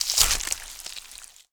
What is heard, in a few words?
gore